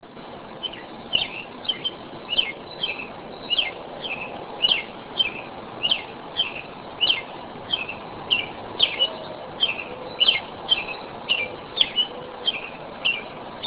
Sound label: robin